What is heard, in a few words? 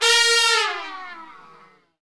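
brass trumpet; Roland L-CDX-03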